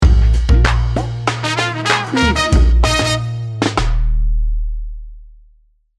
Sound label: swing town sting bumper